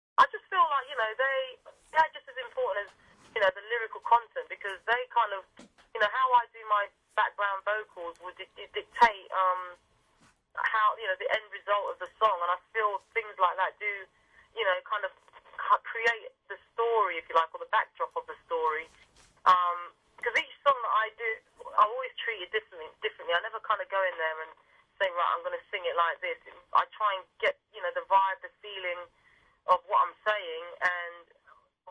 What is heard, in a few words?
Vocal Recording